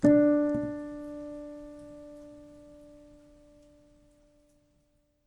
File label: piano sample